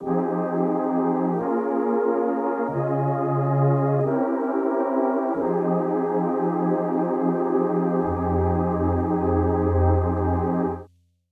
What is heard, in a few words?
Chords Full Lo Fi; midi wizard 2; Unison